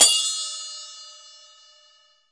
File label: chappa, Chappa and Chanchiki Cymbals; ETHNIC and WORLD PERCUSSION